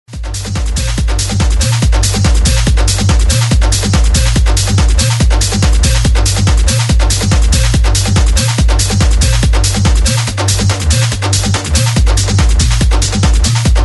Sax

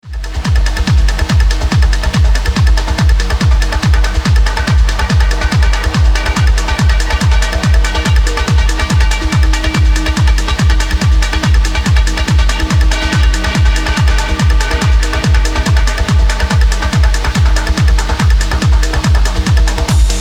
Darbuka

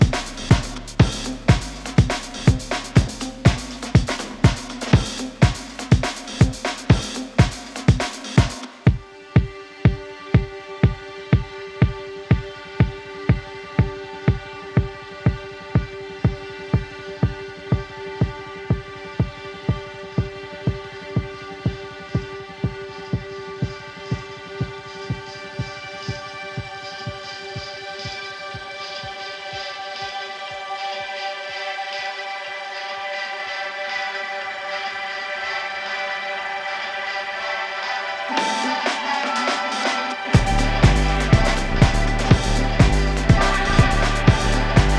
Flute Power

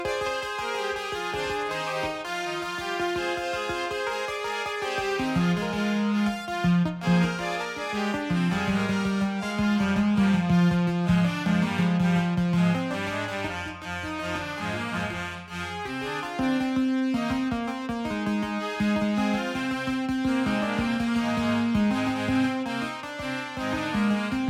Piano Music

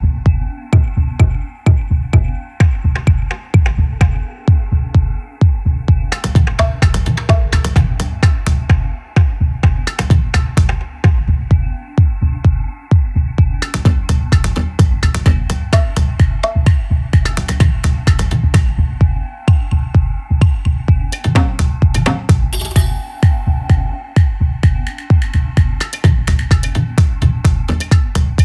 Computer Drumming 128

